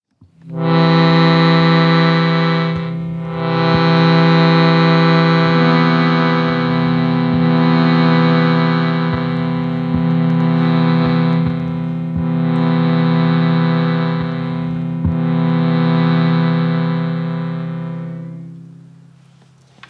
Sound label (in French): shruti box